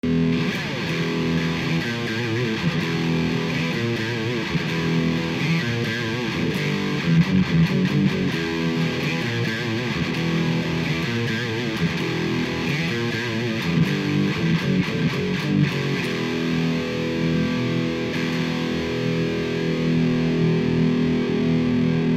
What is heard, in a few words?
Gain Channel Gain 10 Volumen 5 Contour 10